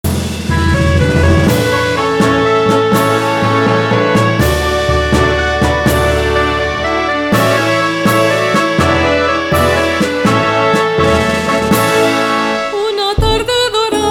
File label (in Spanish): Himno escuela